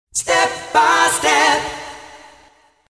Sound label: echo